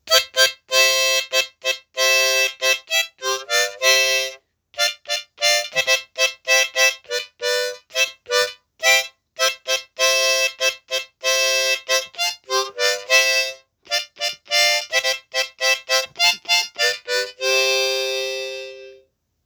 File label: jingle bells harmonica